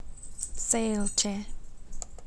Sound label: drinking Glass